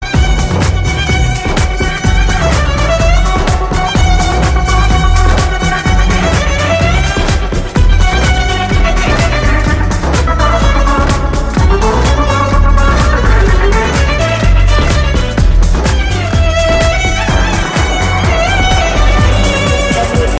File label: violin ringtone; bollywood ringtones